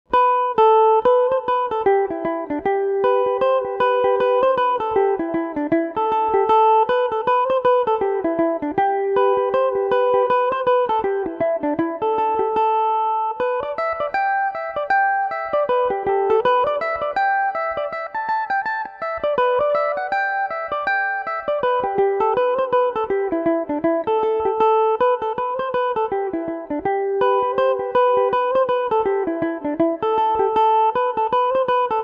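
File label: reel A dorian, irish mandolin; joe banes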